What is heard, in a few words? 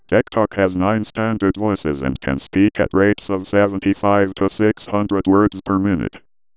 heart